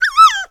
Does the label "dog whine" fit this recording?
Yes